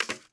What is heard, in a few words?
bad part stone